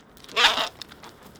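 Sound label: hooded crow corvus cornix cornix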